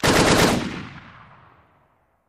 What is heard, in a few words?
Machine Gun Single Rapid Burst Fire